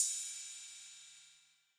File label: Crash